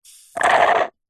food drink milkshake thick suck dregs straw slurp

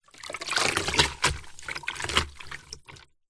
mop into plastic bucket water rinse splosh; household; zapsplat

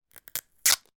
office parcel tape rip from roll

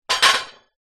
impact metal drain stone roll

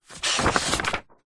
household book artists sketch pad page turn

